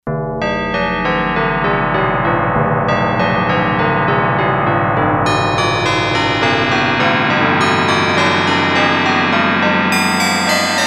Bells, Yamaha TX802; Factory Patches; Voices

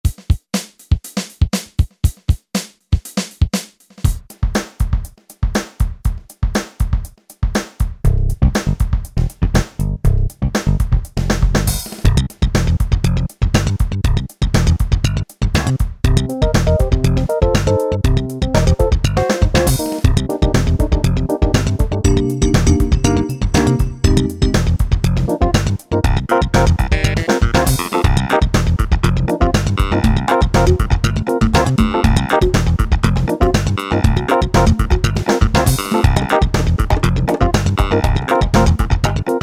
70s MIX, Grooves; Generalmusic Equinox

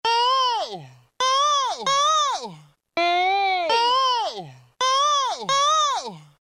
Ahoo Yell; Roland M-DC1

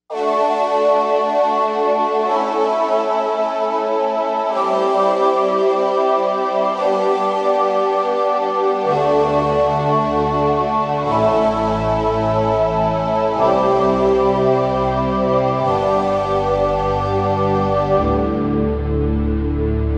Vocoder, Korg M1Rex; Factory Patches

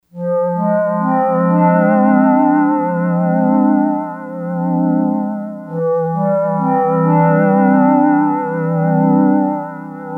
Yamaha DX100; Schmooh; Factory Patches

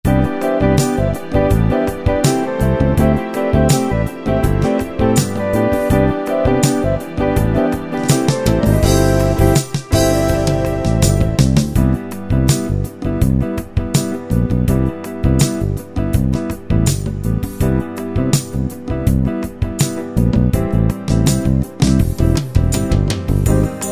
Light Fusion; Preset Styles

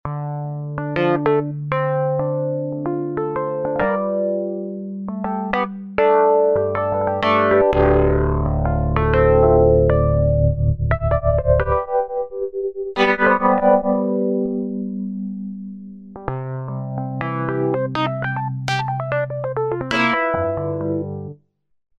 Electro P, Roland V-Synth; Factory Patches

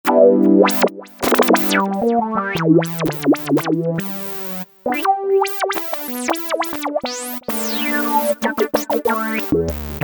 Stab, Korg MS2000; Factory Patches

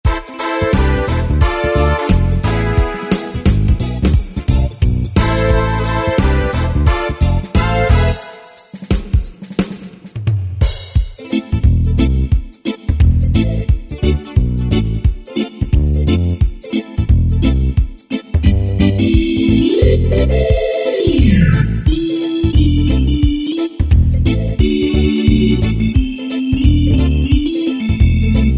Reggae, Yamaha YPT-400

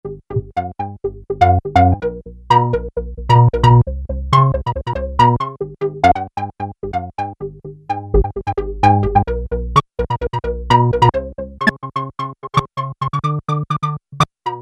unison, Crumar Bit One

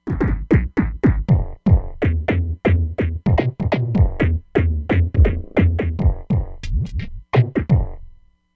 Technotribe, Roland Fantom XR

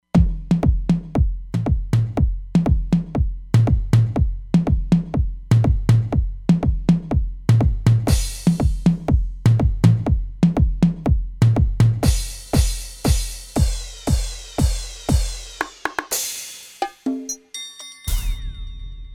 Kit Euro POP, Roland Fantom XR; Drums

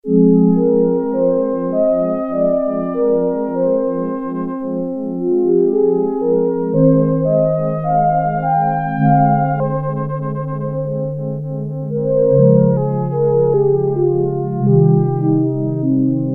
Angelic, Prophet-5; Sequential Circuits Prophet-5 (Ver.3); Factory Patch; File 2 Programs

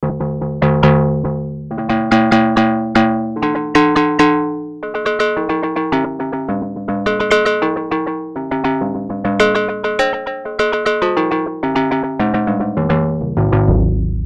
Timbales, Roland JX-8P

